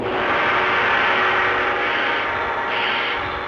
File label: gong